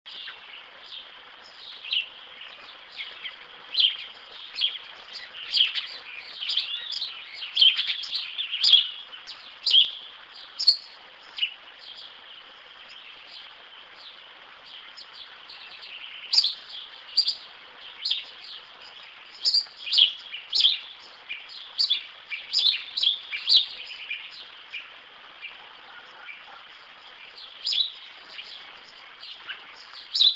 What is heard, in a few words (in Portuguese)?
Pardal